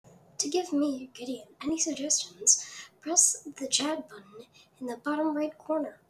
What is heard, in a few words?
australian babies